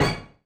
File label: Korg 01W Dance Kit; 300 Drum Machines